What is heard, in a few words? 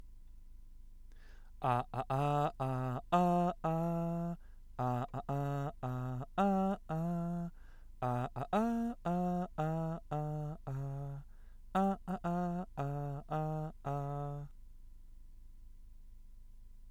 Singing Happy Birthday without lyrics